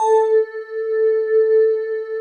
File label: BELLS AND; Synclavier Sampler Library; KEYS AND GUITARS